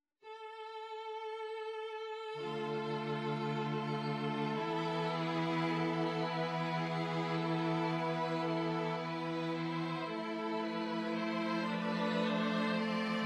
Adagio for Strings STRING TRIO; A FEW FAVORITE CLASSICS

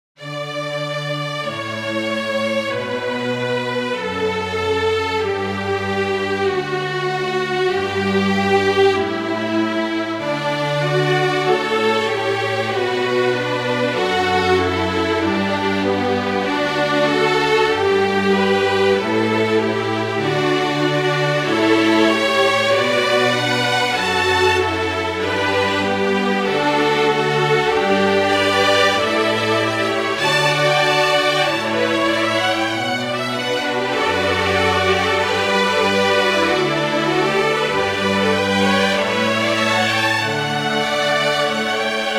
Canon for String Quartet

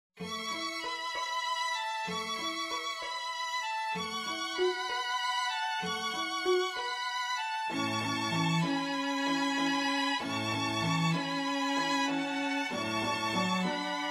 STRING QUINTET

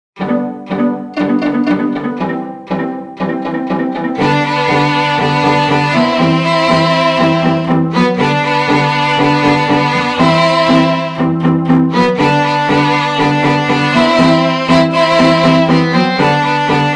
QUARTET

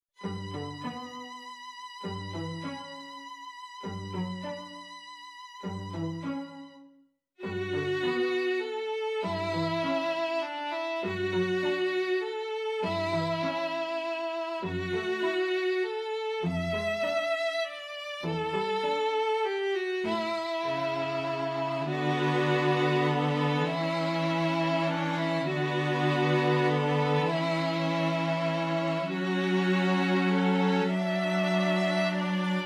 Lullaby

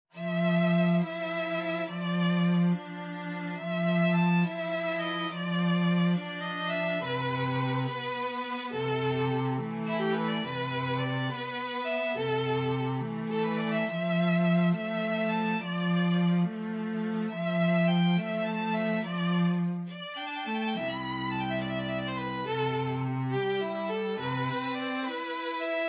STRING DUO

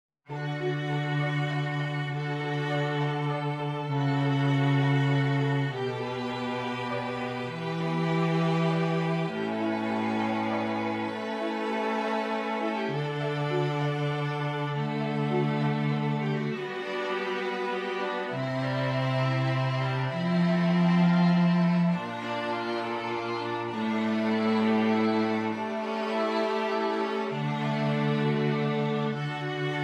STRING QUINTET

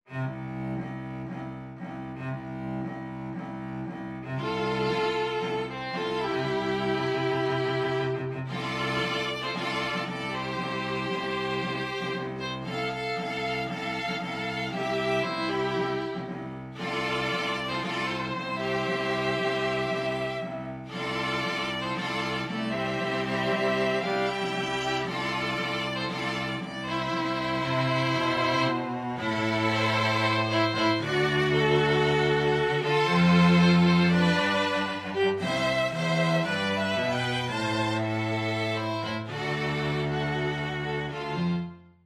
STRING TRIO BASS

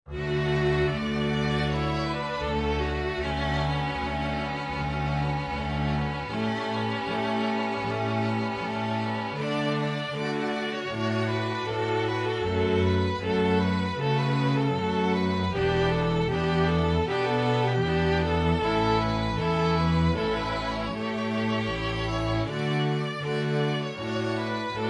STRING ORCHESTRA

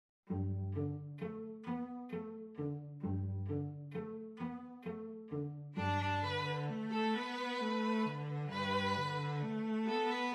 STRING DUO Indian Song

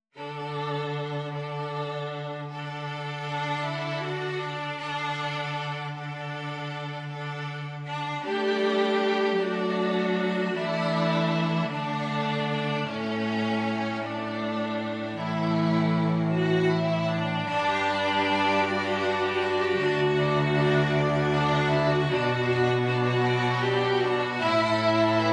STRING QUINTET

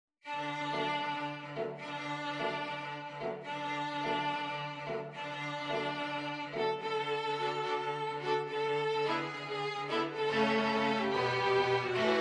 STRING QUINTET

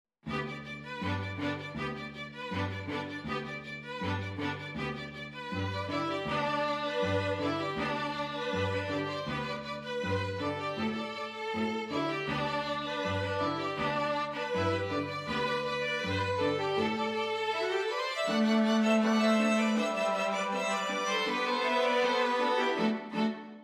STRING QUARTET, INDIAN MUSIC

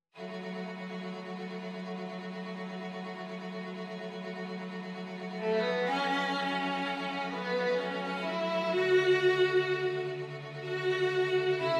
STRING QUARTET

